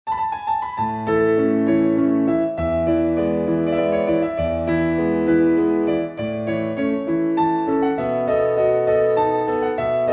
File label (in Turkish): santral fon muzikleri